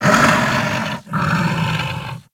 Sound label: Kubrow Feral Vocal Breath Walk; Enemies; Warframe